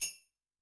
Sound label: Sl Bell, Yamaha DTXpress-II, Perc